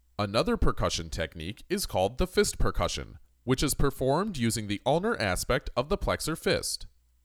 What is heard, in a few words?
Percussion